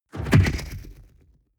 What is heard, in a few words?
sci-fi wobble explosion